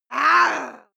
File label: female aggressive snarl; schreie; menschen